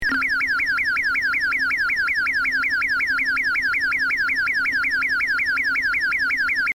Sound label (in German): Eriston 150 Ton